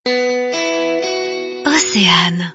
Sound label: jingle; oceane